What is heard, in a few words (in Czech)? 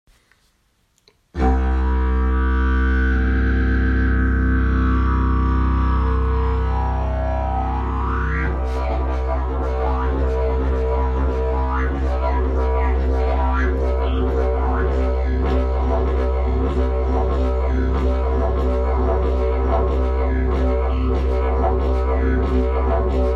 jilm, didgeridoo; jilm lsddj